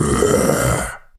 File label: zombie groan; More Creatures